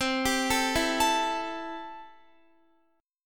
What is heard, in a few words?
C 6th C down Guitar; arpeggiated